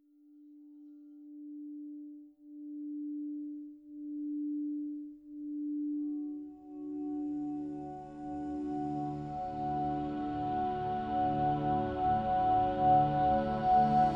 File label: cry bowl